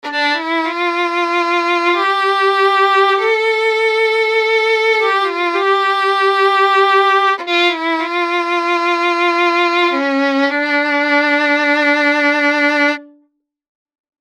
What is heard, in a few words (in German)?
Violine ohne und mit Hall